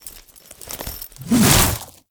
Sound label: Overhead Long Smash Attack Start; Enemies; Warframe; Grineer Nightwatch